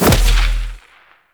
Kick; Edge Kick; Warframe; Pacifist

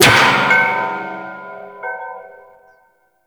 Lift Bell; Warframe; Duviri

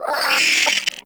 Vocal Angry; Hyena Micro

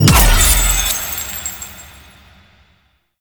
Boss Platform Break; Warframe; Cephalon Weave

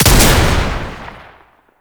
Bayonet Fire; Warframe; Tau; Roathe; Weapons

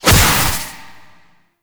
Corruption Pulse; Enemies; Abilities; Warframe; Zariman; Void Angel

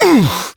Pain, Adult Operator; New War

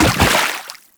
Land Water, Footsteps; Warframe; Teshin

